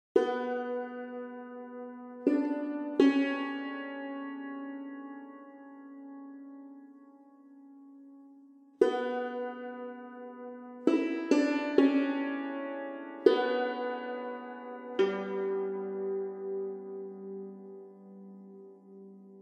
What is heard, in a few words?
Santoor Taksim